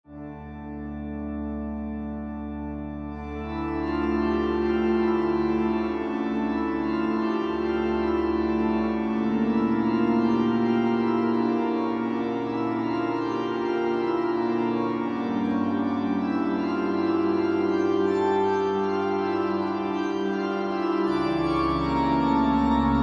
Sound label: Pipe Organ; LABS Pipe Organ; Pangea